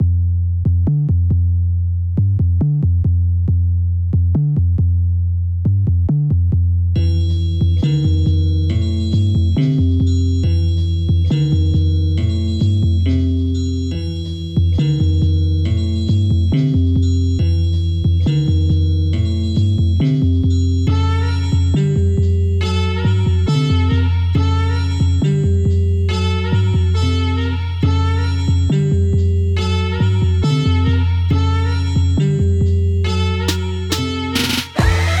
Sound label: Instrumental